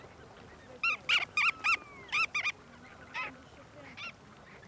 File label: Bar tailed Godwit